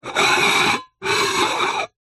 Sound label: household pot lid clay or ceramic lid turn rotate spin on pot